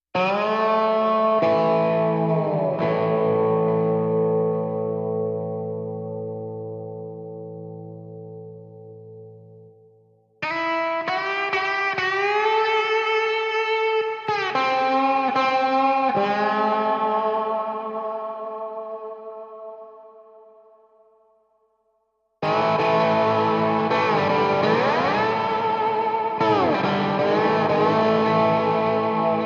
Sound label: Aminor Guitar Only